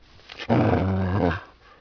dog growl

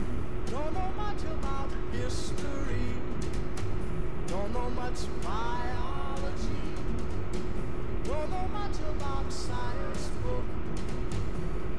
music with noise

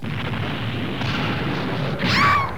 THUNDER

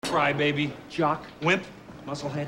crybaby

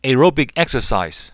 aerobic exercise

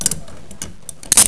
handcuff

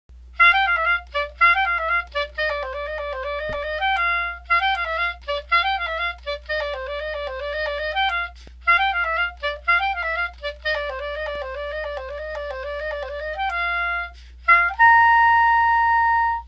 Bb Curved Saxophone example

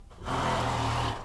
long blow; model railroads

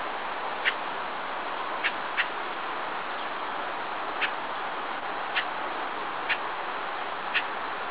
catbird; birdsongs

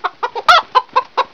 cluck

